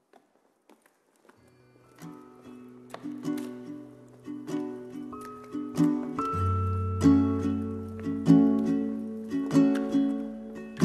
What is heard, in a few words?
slowed reverb